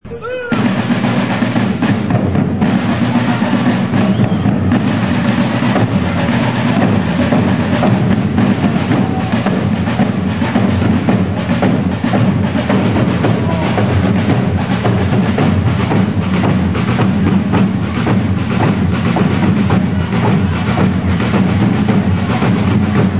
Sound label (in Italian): tamburi